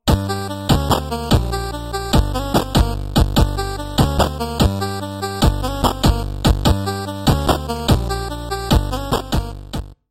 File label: 8bit